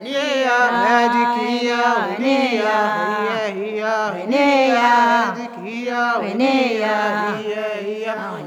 WENEEYAH, MIX.CHOIR; Spectrasonics; Heart of Africa 2

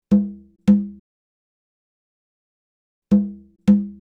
Conga, Latin Percussion

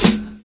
PHAT BELL; Univers Sons - Kitch 70s

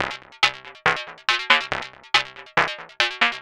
synth 140 multicomb C; Transmission-X

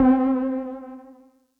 Synth C, Synth Prophet One Shots; Electronisounds

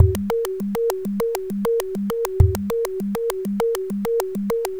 Percussive

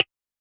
JNGL SN, Snare; Yamaha DTXpress-II

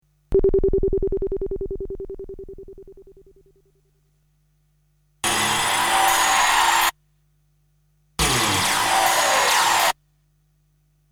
Sound Effects; Kniteforce; 1000 Samples Volume 1

